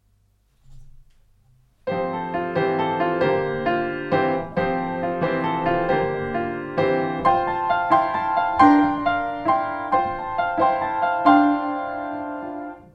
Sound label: in dulce jubilo backing